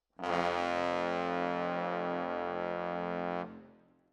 Trombones, jazz for piano

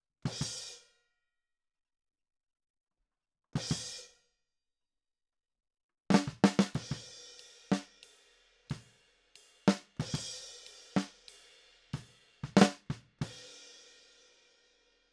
Snare

